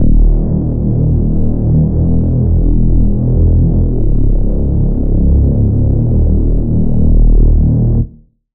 Reese; Crimson City; Routed Sound; Vital Expansion - Noble Gangster Vol.1

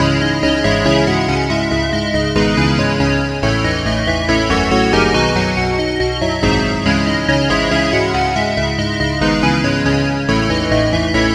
Key, Serum 2; Key - Prime; Routed Sound; Serum 2 Expansion - Reality Maker Vol.1

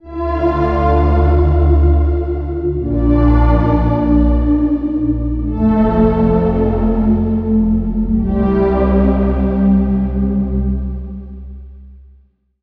Pad; Routed Sound; Serum 2 Expansion - Star Scavenger Vol.1

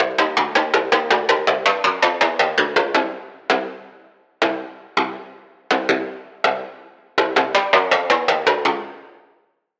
Pluck; Top Knot; Routed Sound; Vital Expansion - Noble Gangster Vol.1

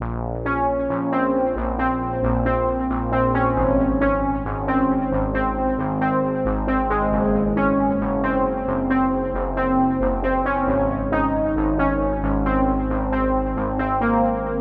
Lead, Spire; Routed Sound; Spire Expansion - Reality Maker Vol.1

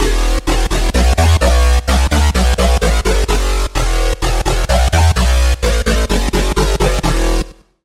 Bass; Nitro Blast; Routed Sound; Serum 2 Expansion - Hyper Gamer Vol.1

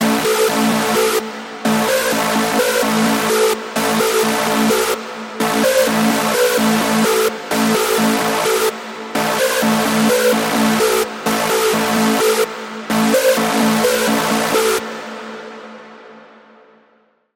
Lead; Routed Sound; Spire Expansion - Chaos Bringer Vol.1

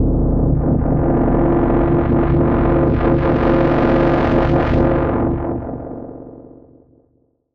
Furnace; sfx; Routed Sound; Sylenth1 Expansion; Chaos Bringer Vol.1

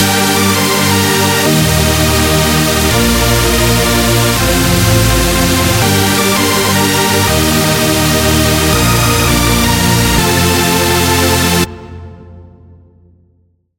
Chord; Routed Sound; Spire Expansion - Flame Summoner Vol.1

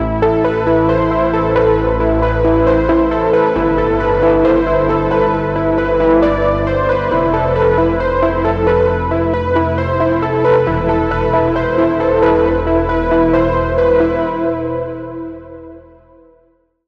Key, Spire; Circuitry; Routed Sound; Spire Expansion; Reality Maker Vol.1

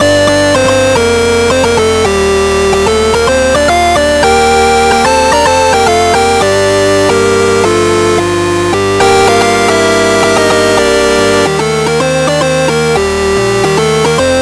Lead; Main Character; Routed Sound; Spire Expansion - Hyper Gamer Vol.1

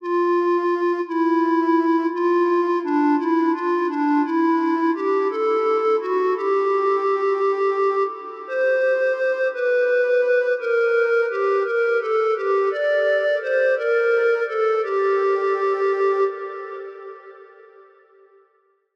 Woodwind; Routed Sound; Spire Expansion - Story Walker Vol.1

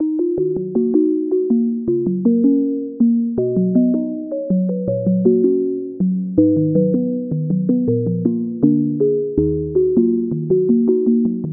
Pluck, Sylenth1

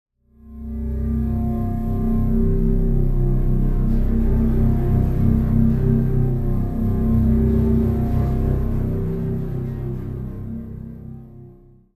Pad, Sylenth1; Esoteric Horror; Routed Sound; Sylenth1 Expansion; Doom Carpenter Vol.1

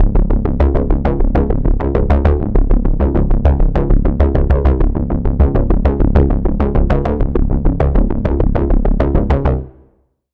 Pulse, Sylenth1; Pulse - Activist; Routed Sound; Sylenth1 Expansion - Quantum Enforcer Vol.1

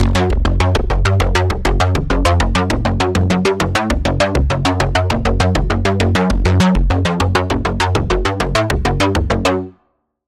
Pulse; Routed Sound; Spire Expansion - Quantum Enforcer Vol.1

